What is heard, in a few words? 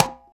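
Conga Hi Mute; Roland BK-5; Percussion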